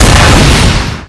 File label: giant custom explode